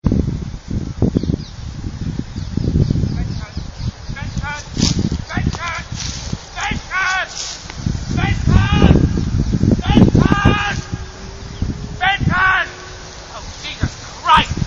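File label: Is Calling R&B, ringtones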